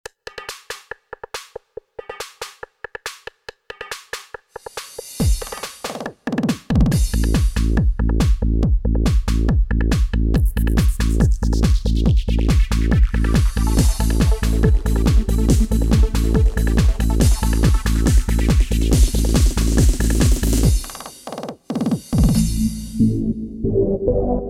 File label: SH32 Trance